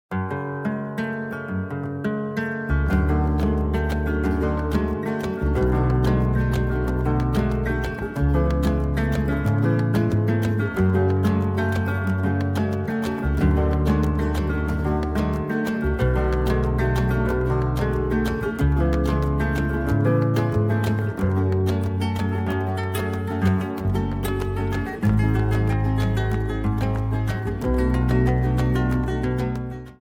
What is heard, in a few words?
ringtone